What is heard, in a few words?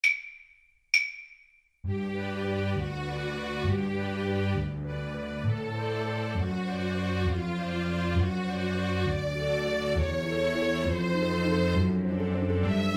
waltz, cello